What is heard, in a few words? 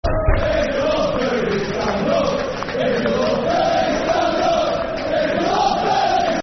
Stand Up If You Love Bury, Football Chant; Bury Bfc